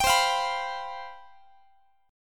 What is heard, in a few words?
C Major 7th Suspended 4th, down, Guitar